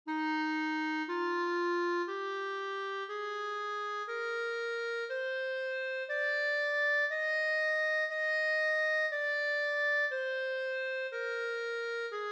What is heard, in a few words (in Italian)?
Clarinetto in Mib